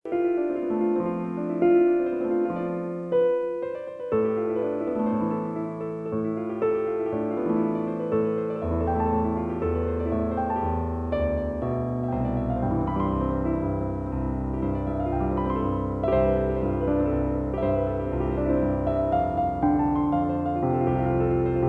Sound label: Piano